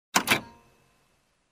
Machine Handle Clank

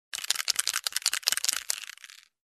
Bottle with plastic inside

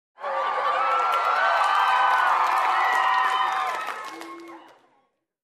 Applause and Cheers

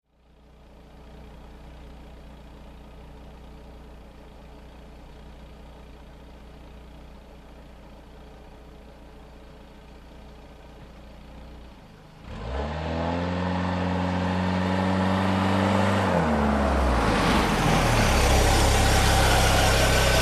Car approaching and parking

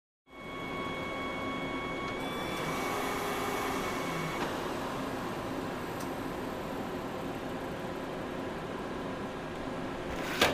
Copier

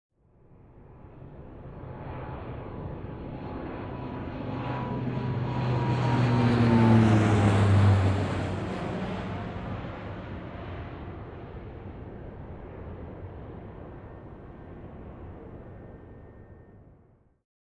Aircraft Flying Over Whirring Sound

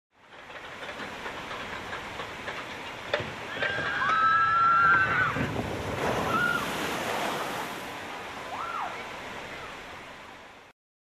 Water Park rides

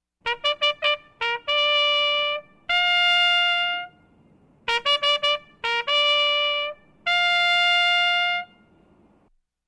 Trumpet Recall

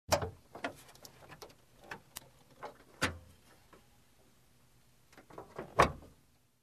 Working on motor compartment

